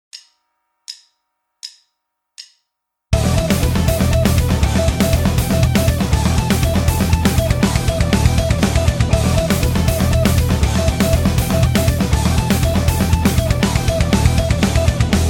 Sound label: guitar